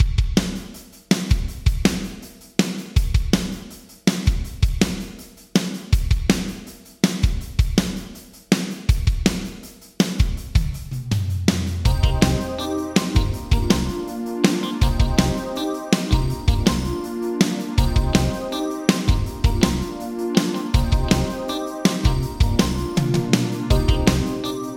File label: Drums Yamaha ProR3 Mood Room Vintage Digital